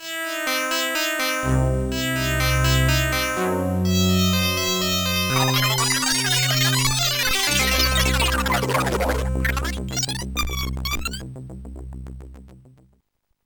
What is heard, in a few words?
threatening; Sonix Music Driver